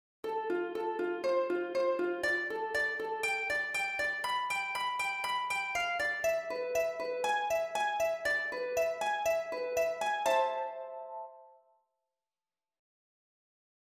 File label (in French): harpe